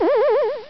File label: effect, video games; dkong